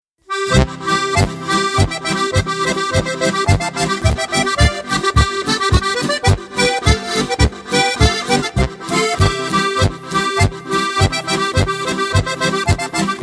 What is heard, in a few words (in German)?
boarischer